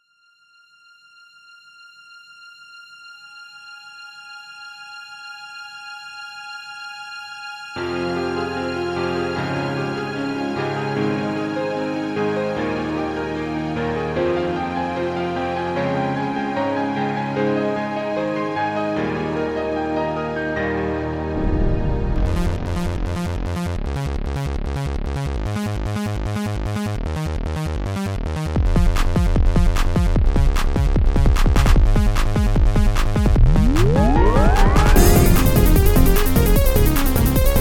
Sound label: Twilight Techno